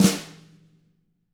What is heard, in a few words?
FLAM, Drums